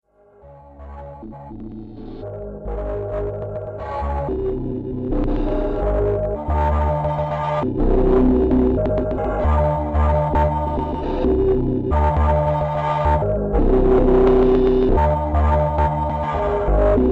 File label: Broken Dubstep Drone; Drone Sound Effects; sound design